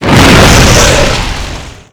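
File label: fire vehicle flame up medium big; Explosions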